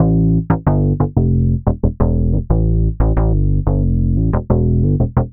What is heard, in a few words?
Synth Bass